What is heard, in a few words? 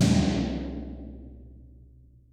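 16 tom; Best Service; Real Mega Drums VOL-1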